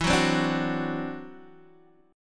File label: E 7th Suspended 2nd Sharp 5th, E, down, Guitar, DADGAD